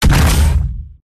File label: footstep; silverback